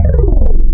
Male Ooh Vox, wavetables; Animoog

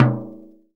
MID TOM, VINTAGE FUNK; Zero-G; Funky Elements

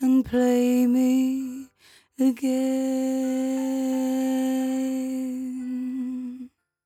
57bpm Am, Velvet Vocal; Zero-G

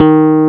PUNCHREEDS; Wizoo - Powered DX

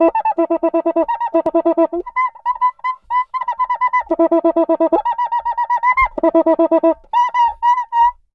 Cuica Samba 120 bpm; Best Service; Festa Latina VOL-1

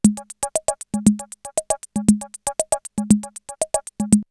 CR78 R77 BeatBox, drumloops, DrumMachines

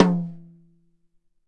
GTom, E-MU PX-7

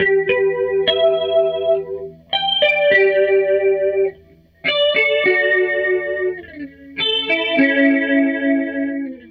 Strat Guitar Loop

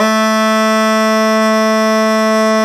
BASS SHAWM; SoundBytes - Early Patches

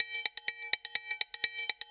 Percussion Loops, Brazilian; Houseworx

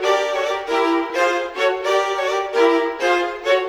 Viola Violin, Orchestral Series Rock and Pop; Sonic Foundry (Sony Creative Software); Orchestral Series VOL-4 - Rock and Pop

